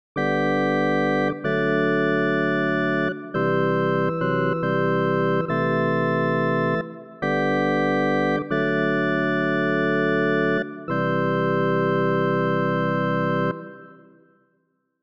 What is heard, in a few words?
Organ Hall; Zoom R20